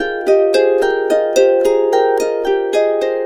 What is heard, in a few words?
C 110, Complete Harps; USB Soundscan vol.62; AKAI